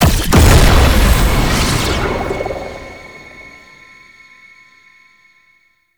Ability Lockout; Entrati; Conquest

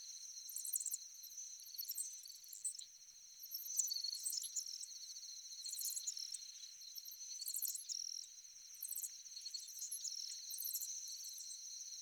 Critter Loop, Glades; Ambience; Glades Of Andurin

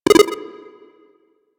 Item Pickup; Warframe; Wyrmius minigame

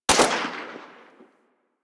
Fire Mech, Weapons; Braton MK One Redux; Warframe

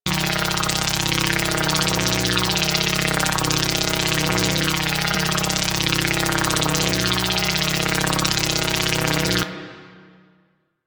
Retrieval Shield Drain Loop; Missions

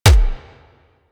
Weapon Punch Cannon

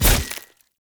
Sphere Polearm Medium Impact Flesh; Weapon Impacts; Player; Combat

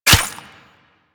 Melee Dagger Impact Flesh; Warframe; Weapons; Daggers

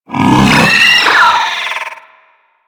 Pet Vocal Pain; Enemies; Vocals; Warframe; Alad V Pet

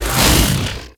Ice Wave Leg Whoosh; Warframe; Corpus; Arachnoid Camper Terra; Abilities

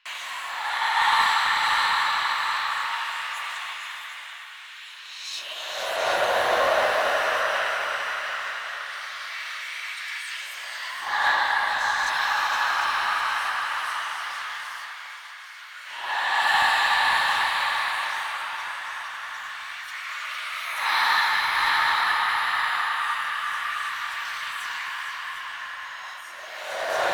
Feather Whisper Loop; Warframe; Jade Shadows; Quests

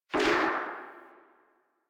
Vomit Gun Tail, Weapons, Infested; Warframe

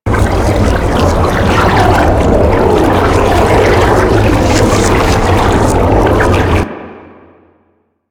Water Fall Reverse Loop A; Ambience; Interior; Warframe; Orokin

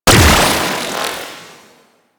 Droid Array Spin, weapons; Warframe; Cephalon

